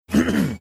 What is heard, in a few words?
clearing his throat